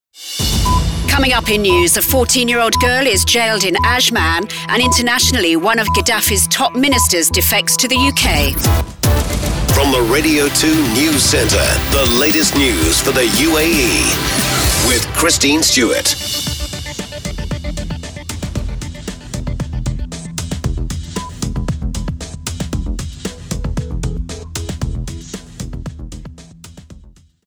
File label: News Theme